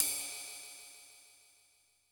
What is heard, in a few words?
Ride Cymbal, Standard Kit, Yamaha PSR-E323